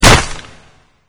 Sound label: chomp; slender; hellknight